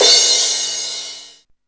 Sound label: CYMBALS, Disco House; AKAI; USB Soundscan vol.20 - Fresh Disco House I